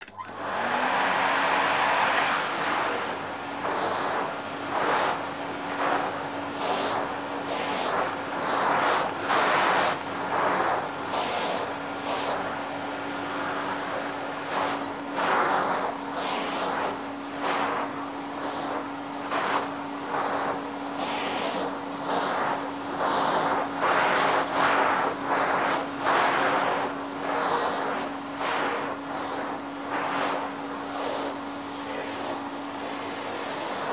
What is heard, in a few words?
vacuum cleaner